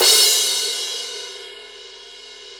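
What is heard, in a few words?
cymbal crash; Sound & Vision - Gigapack I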